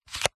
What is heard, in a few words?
household phone charger wireless slide open; zapsplat